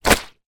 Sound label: wet towel drop on ground; foley; zapsplat